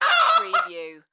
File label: Chicken Cluck